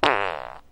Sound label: fart shortish